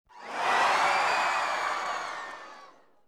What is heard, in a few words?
whee